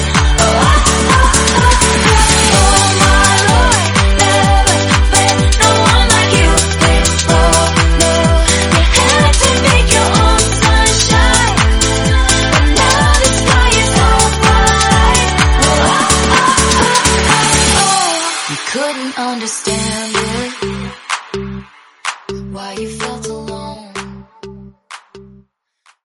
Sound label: 126bpm G